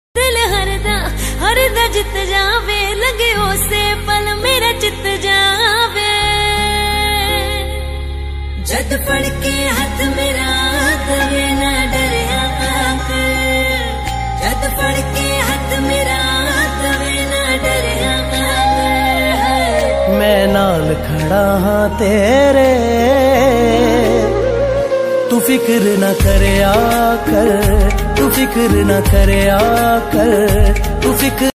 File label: fikar new ringtone sad ringtone bgm